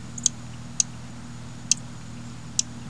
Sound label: junco; birds; usgs